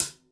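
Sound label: JAZZ KIT hi hat, Drums Acoustic; AKAI; JAZZKIT 1; 90s sample CD; USB Soundscan vol.10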